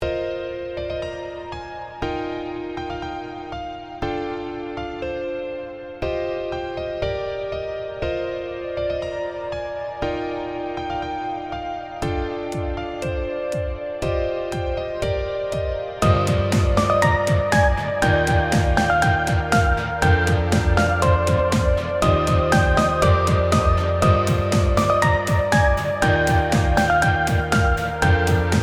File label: Fantasy, good night; It may have turned out to be more fantastical than I expected.